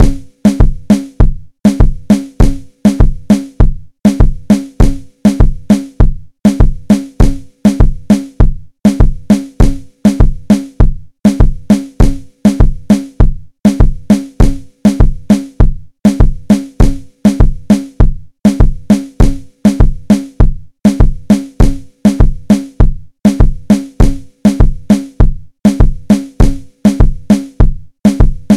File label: reggaeton base